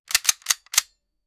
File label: firearms, sound-effects